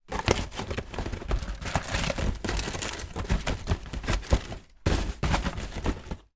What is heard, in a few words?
kitchen cereal boxes rummage movement in cupboard; zapsplat